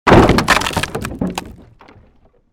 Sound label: created wooden barrier smash medium